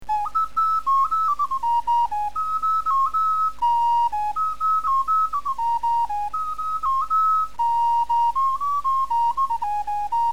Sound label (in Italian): flauto globulare; maraichine de machecoul